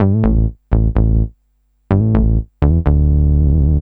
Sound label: bass, Roots Funk; USB Soundscan vol.41; AKAI